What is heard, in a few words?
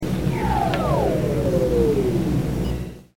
engine